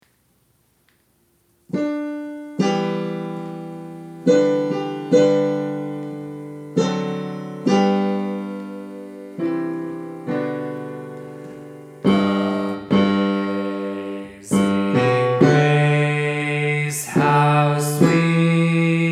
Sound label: Amazing Grace Bass